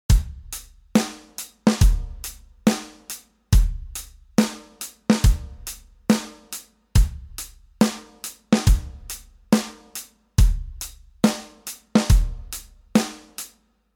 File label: swing